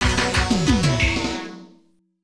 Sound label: COIN; Syvalion; arcade